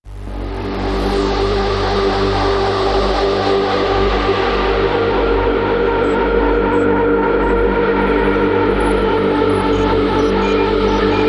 Ambient

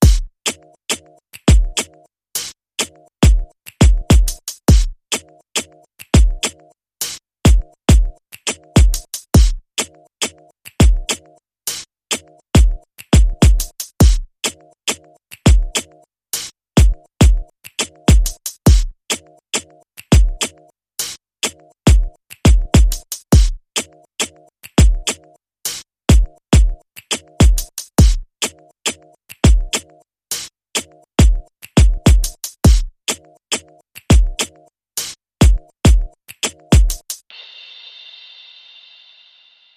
Beat

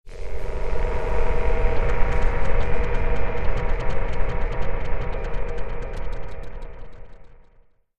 Space Drone

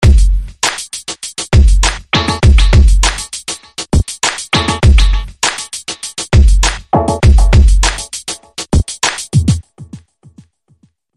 Bass Funk Tech

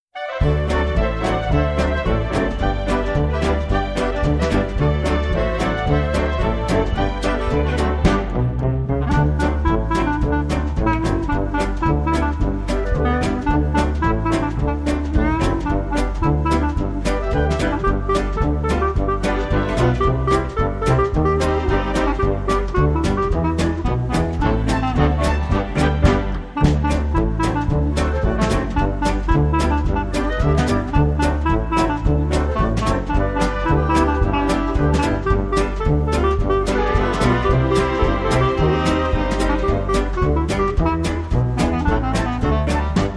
Clarinet Theme

